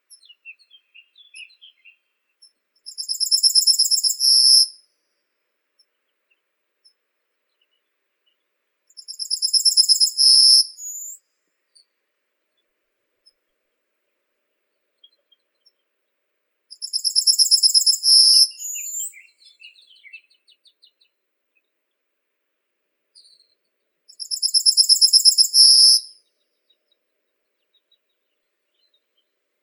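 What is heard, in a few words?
yellowhammer, Emberiza citrinella; ovsyanka obiknovennaya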